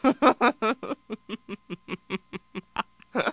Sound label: people